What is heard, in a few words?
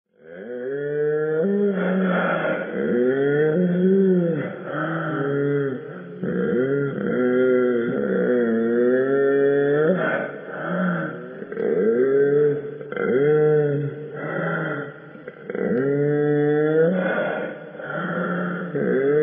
Spooky Moaning GHOST